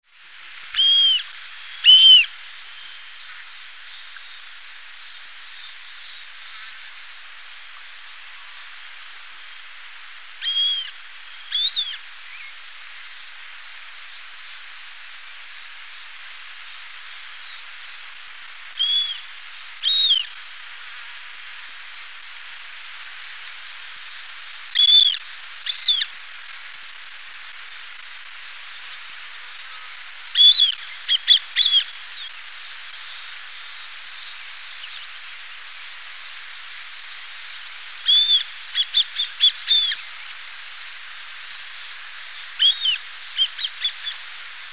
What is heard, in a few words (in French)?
busard de buffon, chant